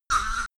Croak

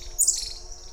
nigrifrons, Saguinus nigrifrons

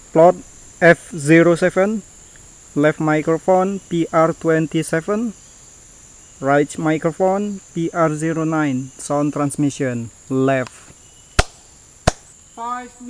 birds

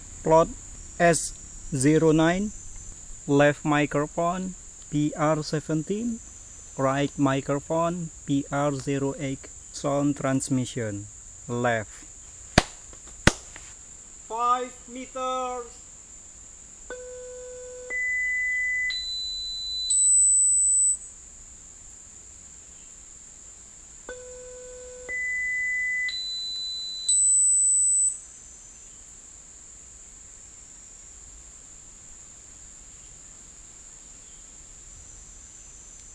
birds; ecoSound-web

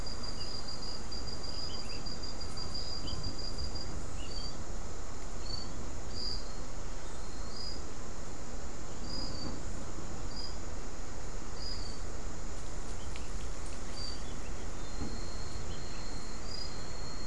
mixture of bird and insect

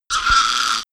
Croak

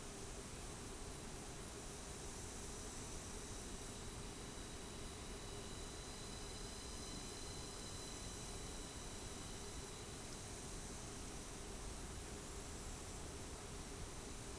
mixture of bird and insect

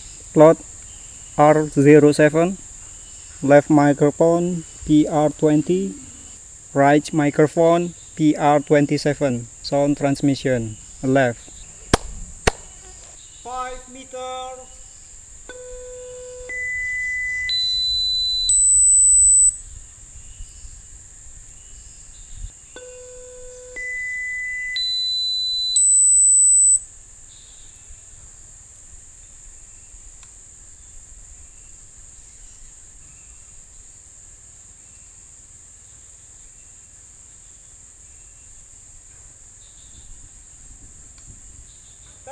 birds; SoundEFForTS Landscape assessment

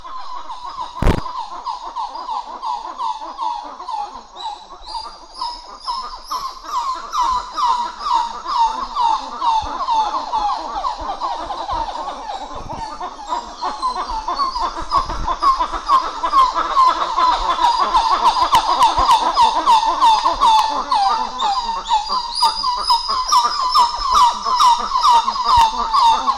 duet, Plecturocebus cupreus

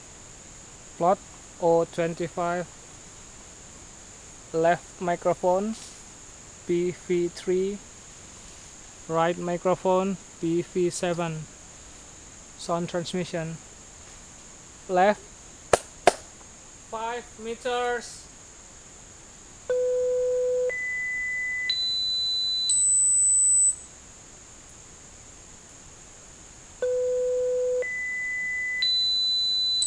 birds

